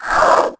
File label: roar